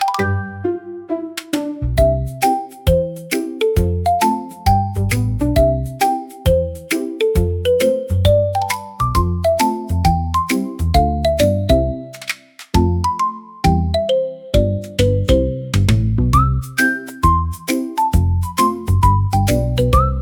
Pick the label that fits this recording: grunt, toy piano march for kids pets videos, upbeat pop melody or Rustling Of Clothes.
toy piano march for kids pets videos